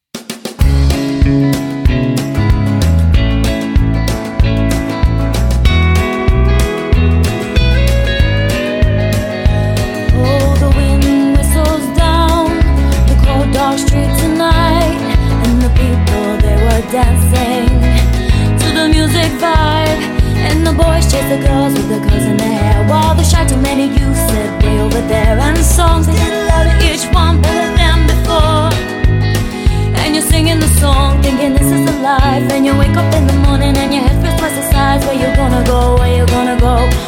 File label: Rock Pop Band